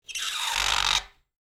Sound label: Rubber Squeak